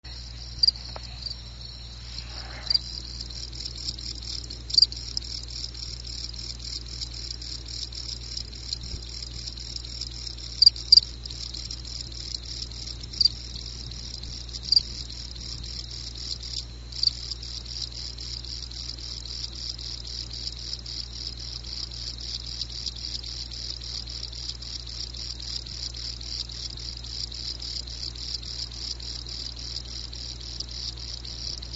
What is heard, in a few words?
Fall Field Cricket Gryllus pennsylvanicus